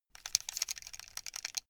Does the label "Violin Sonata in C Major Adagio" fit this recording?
No